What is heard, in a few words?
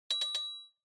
Inspiration